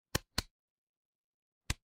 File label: ui toggle